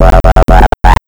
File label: Sound FX Acid Scratch; Alienistcog